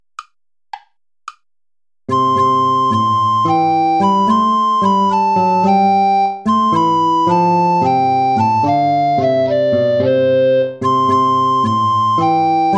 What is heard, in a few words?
play along without tenor recorder; hassler hans johann leo; mighty fortress our god